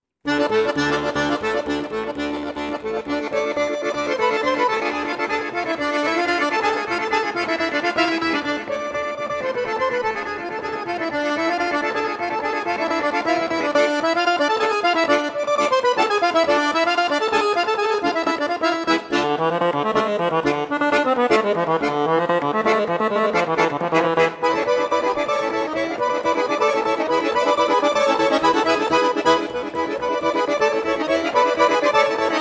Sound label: Arabesque Bulgarian suite Gankino Horo